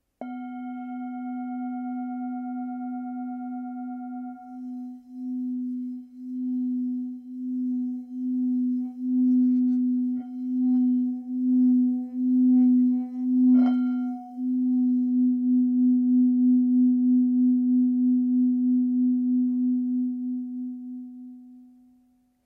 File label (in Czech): tibetska misa; nagpa stredni